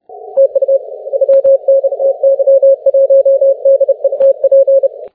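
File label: 12m continuous wave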